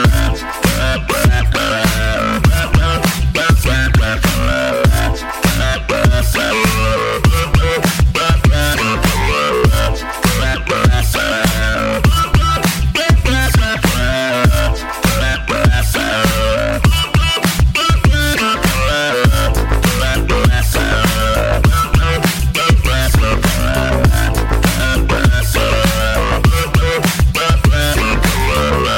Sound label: electro funk